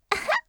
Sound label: "aha"